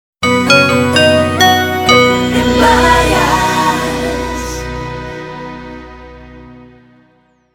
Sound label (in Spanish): audiologo movil; emvarias